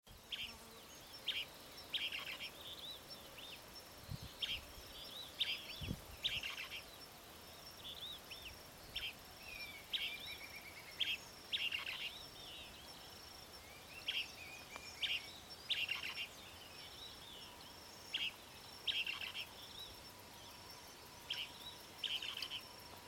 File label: Lesser elaenia; پرنده ابروزردک کوچک